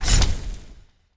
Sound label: pwr swing; serqet; smite